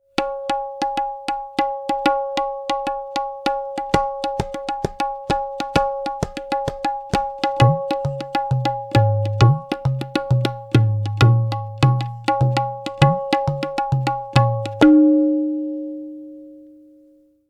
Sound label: Ewe Gankogui; talas